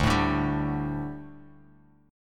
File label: D Suspended 4th D, down